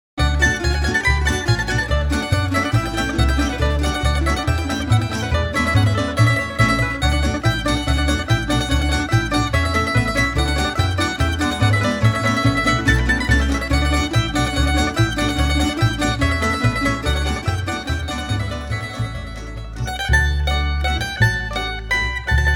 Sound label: tambura